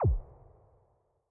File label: reel Stop; netent; neonstaxx